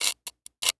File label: Glass Sharp Glass Scrape; Foley